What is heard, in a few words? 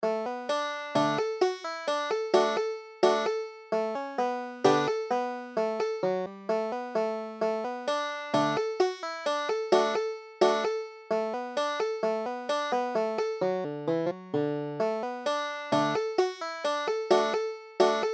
banjo